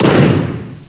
bomb; Bubblomania 1.4

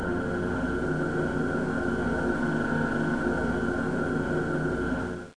atmos

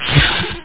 stun rod; Crossfire

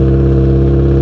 idle, Car

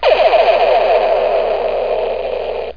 LASERGUN

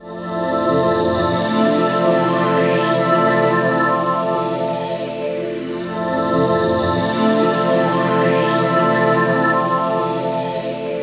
pad; Sonic Foundry; fluid

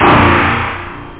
badman, drums misc; OctaMED SoundStudio V1

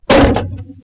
met strk, bugs; Starship Troopers

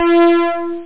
Mars Sound; Amiga 8-bit Sampled Voice; 8,363 sample rate

